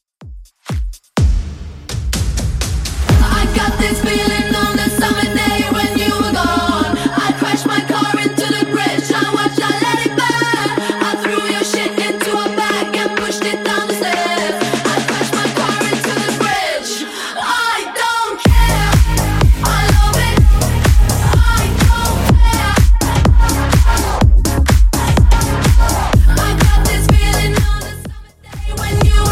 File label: Bootleg House 125bpm